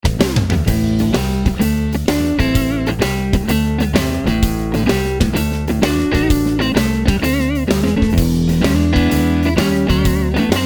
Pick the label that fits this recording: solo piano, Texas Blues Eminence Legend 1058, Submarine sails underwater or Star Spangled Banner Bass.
Texas Blues Eminence Legend 1058